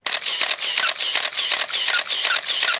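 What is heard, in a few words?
35mm Camera Multiple; shutters & movement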